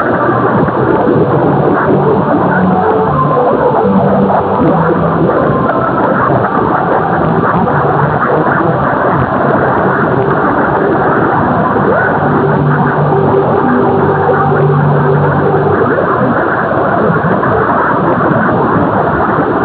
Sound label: 963 nz southern star, AM; Grayland Beach State Park, WA; Perseus & various antennas